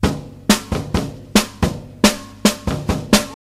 135 bpm old school drum loop sample d key, Free breakbeat - kick tuned to the D note